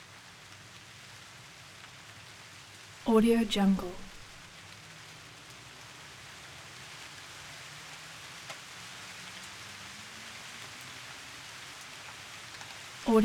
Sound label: rain thunder city